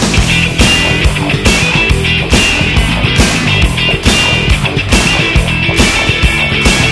funkay

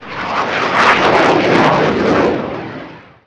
jet flyby; gearbox

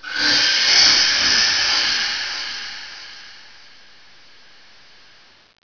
creature

